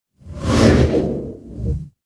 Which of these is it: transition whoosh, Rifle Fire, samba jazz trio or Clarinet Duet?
transition whoosh